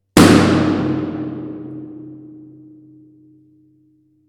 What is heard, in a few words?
Balloon Pop Tunnel; Mead